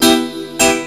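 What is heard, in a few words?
PIANO loop; USB Soundscan vol.27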